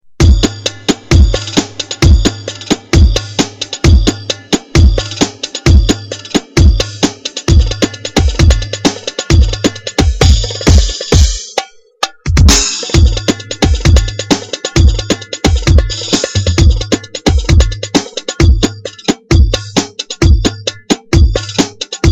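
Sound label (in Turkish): arabesk, Korg PA 500 TR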